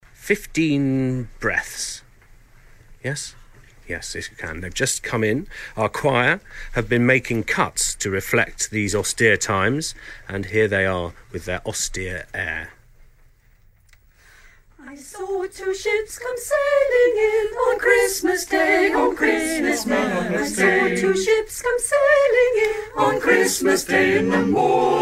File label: english chamber choir